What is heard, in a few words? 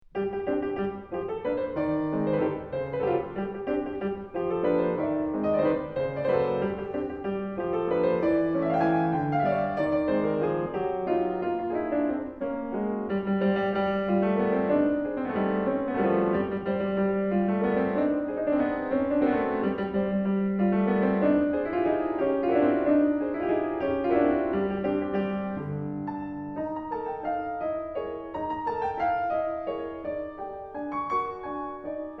Solo Piano, Dance